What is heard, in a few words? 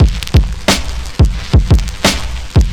88 Bpm Loop